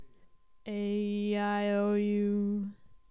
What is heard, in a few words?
aeiou Low; A E I O U Sung in the low range